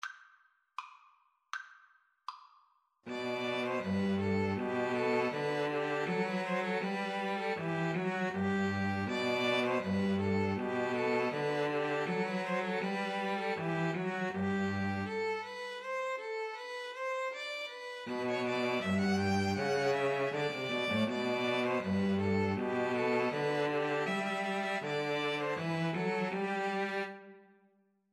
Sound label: deck the halls, String trio